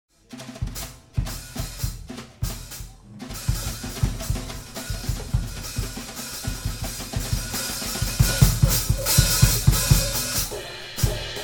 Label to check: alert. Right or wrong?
Wrong